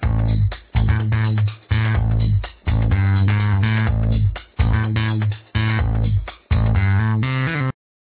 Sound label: ringer; Ringtones